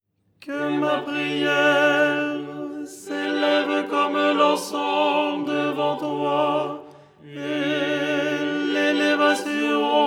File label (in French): tenor; ton 3